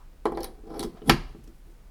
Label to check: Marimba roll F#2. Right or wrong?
Wrong